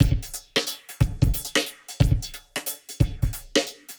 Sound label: 120 Triple Flavour, Warped Live Drums; Loops; Touch Loops; CosmicFunk